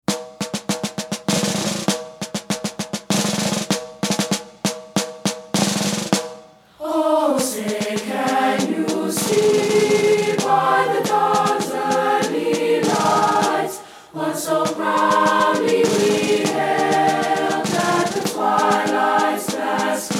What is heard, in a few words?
NATIONAL ANTHEM